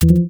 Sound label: comedy tiny fail; reaction